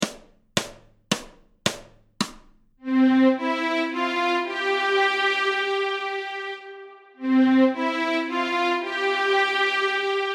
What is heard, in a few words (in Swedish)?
Oh When the Saints Stråk C, Fiol; Notträning